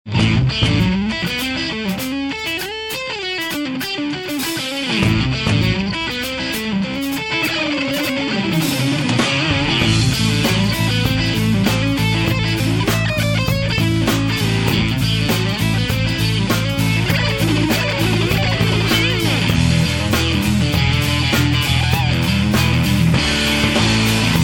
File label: new blues song